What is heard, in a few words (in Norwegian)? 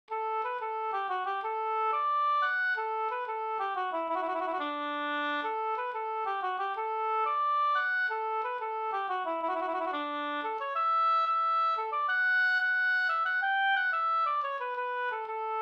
pols; Finnskogspols Fanteladda no